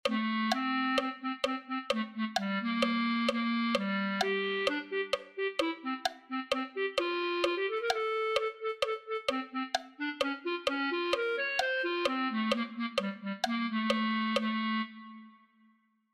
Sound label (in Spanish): clarinete